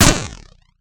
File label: 8bit Hit